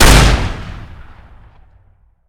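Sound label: Weapon caravan shotgun fire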